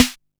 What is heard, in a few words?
Snare, Drum & Bass